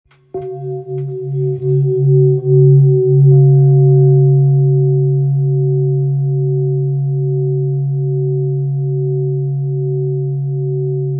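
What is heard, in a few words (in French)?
Bol ramgandi do diese, bol chantant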